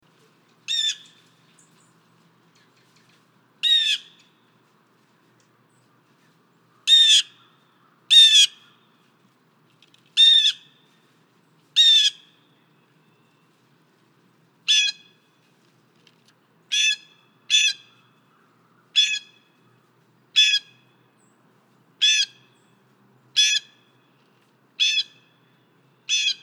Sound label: Blue Jay, Cyanocitta cristata; jays and crows